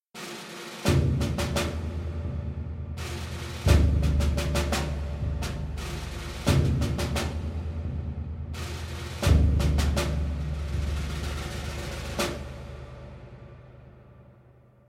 arena explore day

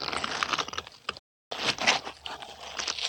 Flesh Cut

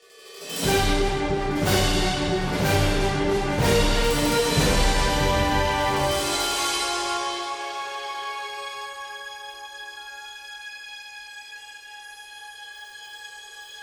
Platform Build; Disney Infinity

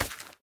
Match Box Pickup; The Town

